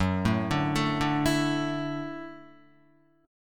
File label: F sharp Minor 7th Sharp 5th, Guitar; arpeggiated; chordshape; 1,0,2,2,2,0